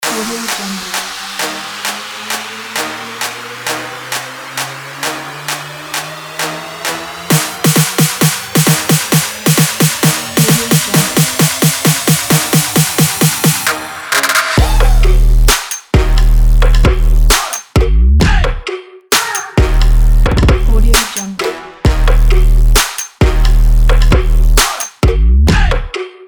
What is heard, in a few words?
dynamic